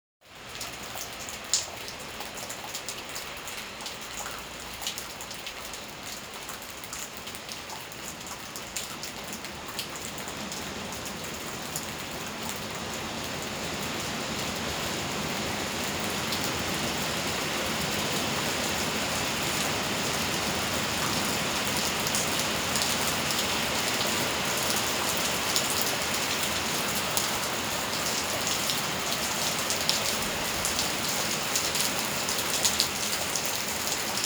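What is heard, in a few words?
sounds of rain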